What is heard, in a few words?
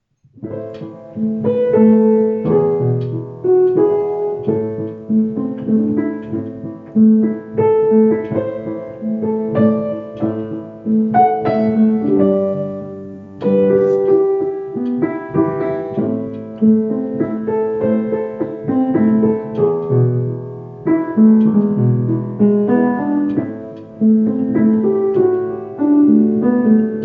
accompaniment flute; Greensleeves